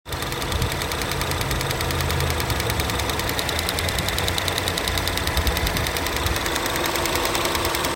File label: normal engine noise mini cooper s; f55-f56; hatch